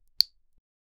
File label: fist clenching